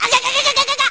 Hurt Shock; Link getting hurt (shocked); zelda

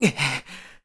Link Carry Stamina Very Low; Skyward Sword

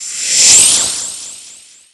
Wind Disappear; Zelda teleporting - Farore's Wind

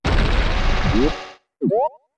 Deku Link Dive; Diving into a Deku Flower

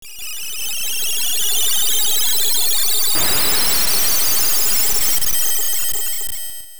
Dungeon Transport Out; Transporting out of a completed dungeon (plays about 4.3 seconds after Siren Instrument tune begins

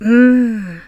"Hmm"; Assistant: "Hmm..."; zelda

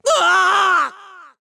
Tornado Thrown; Link thrown from his Loftwing by the black tornado; Skyward Sword; zelda

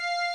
Notes Music Box F loop; Zelda; OOT

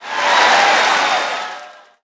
Crowd Cheer

